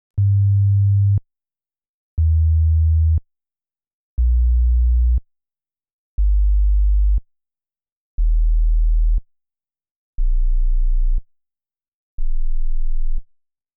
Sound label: Galaxy S II Bass sines waves; 96K Volume 15